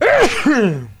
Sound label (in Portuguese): espirro; sheriff